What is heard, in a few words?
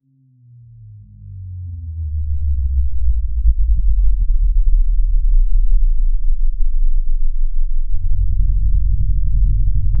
gigantic alien mothership, build up